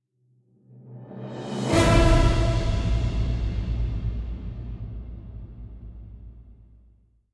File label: Battle Beginning; mass effect 2; Harbringer